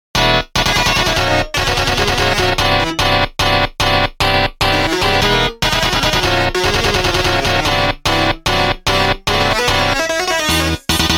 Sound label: instrument solo